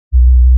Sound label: Censor Bleep 1kHz